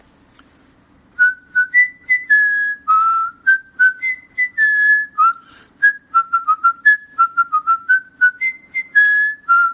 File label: whistle